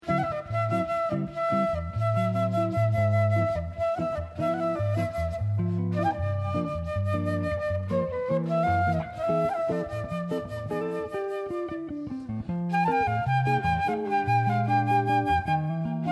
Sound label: flute; woodwind